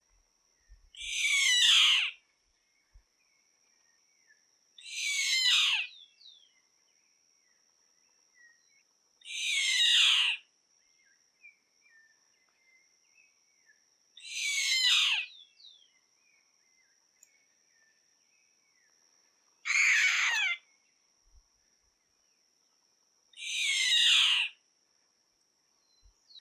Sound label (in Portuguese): Canto do Gaviao Chimango